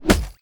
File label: Cyber Punch